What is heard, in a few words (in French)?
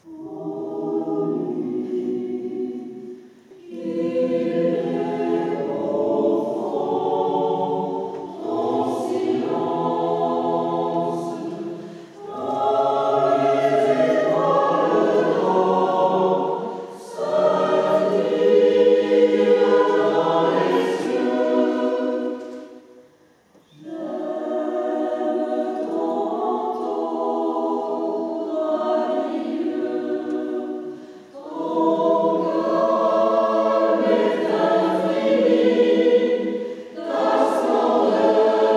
échantillon, chorale